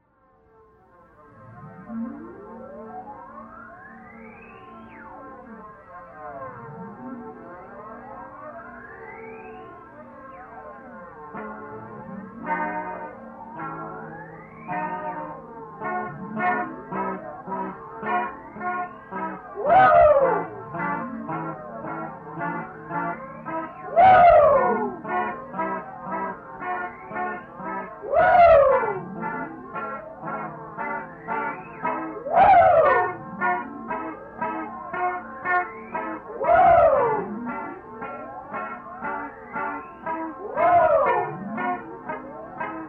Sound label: Polyphonic